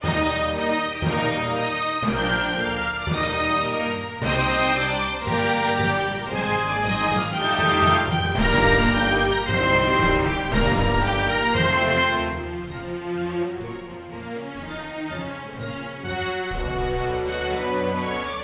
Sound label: Orchestra Only; The East Front